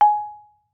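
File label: Marimba rubber fortissimo Ab4; MIS Pitches - 2014; Percussion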